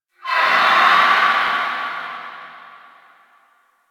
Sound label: Infested Distant Creature Cry; Warframe; Corpus Infested